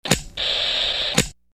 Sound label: tv tune